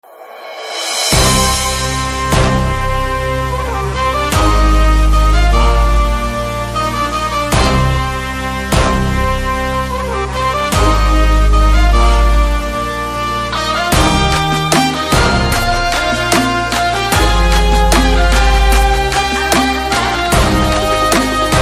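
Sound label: Tamil Title background music Ringtone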